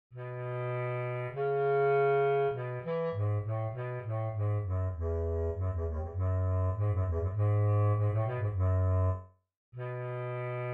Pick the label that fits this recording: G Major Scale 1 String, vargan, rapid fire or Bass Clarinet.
Bass Clarinet